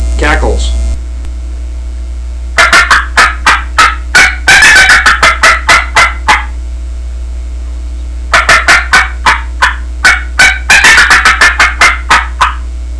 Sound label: favorite cackles, turkey; Southland Sounds